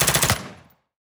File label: enemy rolling Sentry attack